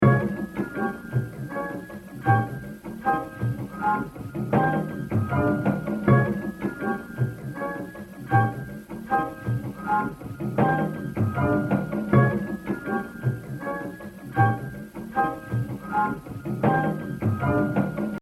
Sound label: Exercise 2, Jazz Drumming - 69%; Baby Dodds; The Evolution of Jazz Drumming (Gottlieb)